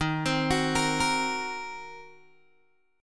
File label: D sharp Minor 6th D sharp down Guitar Open G